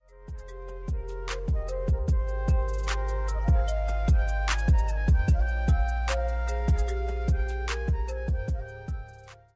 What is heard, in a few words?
red alert instrumental